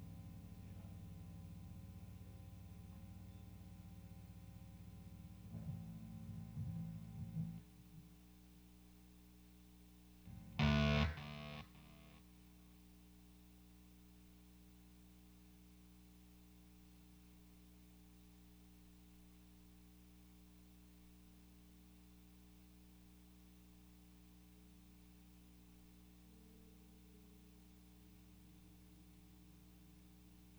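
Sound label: SM57 mono Bass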